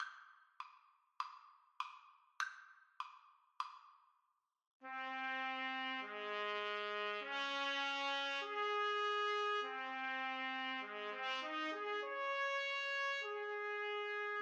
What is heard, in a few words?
loch lomond trumpet duet